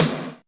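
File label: Snr Grrrated, snare; Alesis D4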